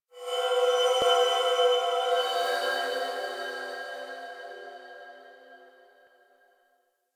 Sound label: Texture One A, Ambience; Warframe; Rail Jack